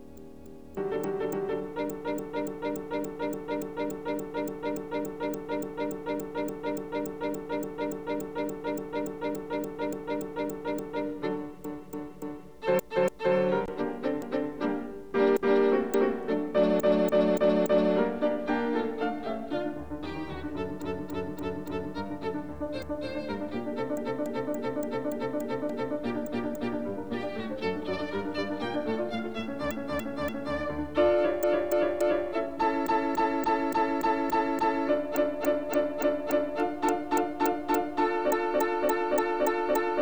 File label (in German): Sonate fur Klavier und Violine Nr. 10 G-dur; op. 96; 3. Scherzo. Allegro; Beethoven, Ludwig van